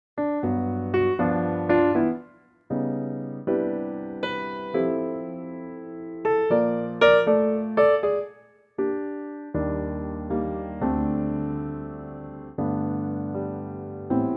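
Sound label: G Piano, male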